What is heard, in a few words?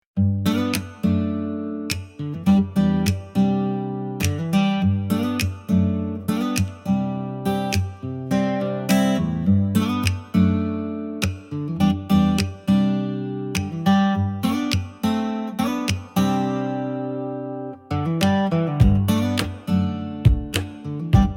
Acoustic beat snap and shine